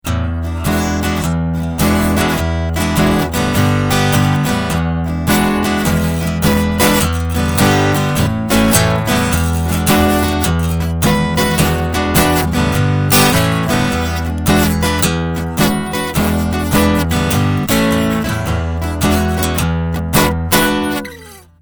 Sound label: Dirty Blues